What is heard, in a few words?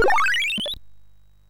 sid MOS6581R3